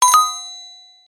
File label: oppo a18 notification light sound